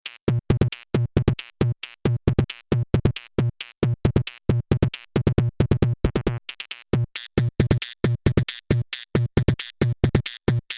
Doepfer Dark Energy III Percussion PWM High LFO Mod